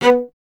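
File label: strings cello B; Miroslav Vitous; Solo Instruments VOL-1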